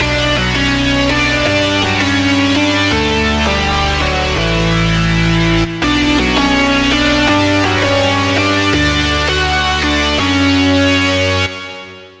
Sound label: Lead; Spirit Carrier; Routed Sound; Serum 2 Expansion - Flame Summoner Vol.1